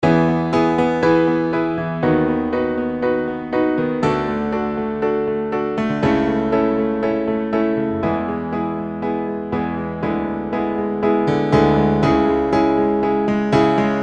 Loops

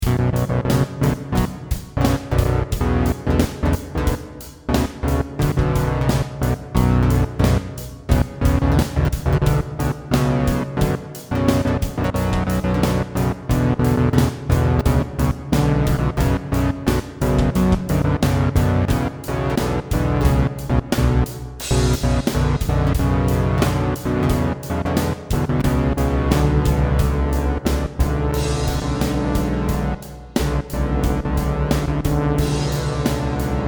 Glitching

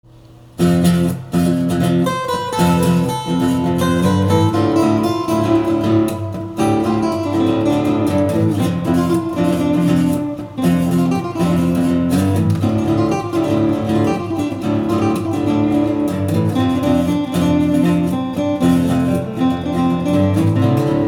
Acoustic

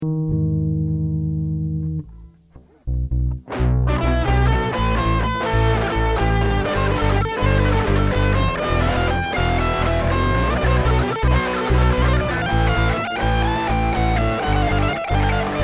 Fallen Solo

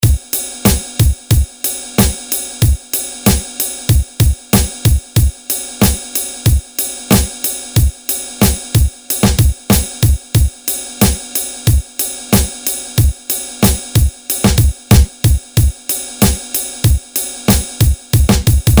Drums

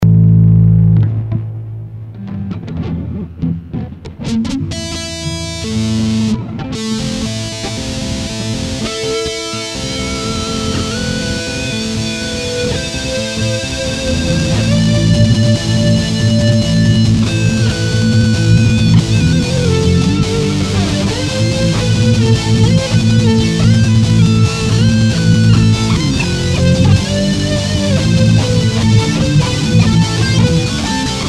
flange

